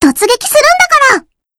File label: hit